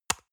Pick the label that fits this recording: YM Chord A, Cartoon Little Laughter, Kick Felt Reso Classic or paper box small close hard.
paper box small close hard